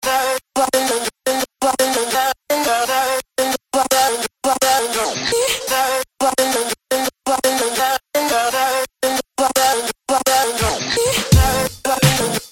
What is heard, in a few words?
Siren - Run Away; Bunny Hop League